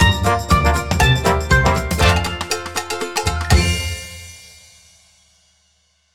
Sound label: free spin end standard; netent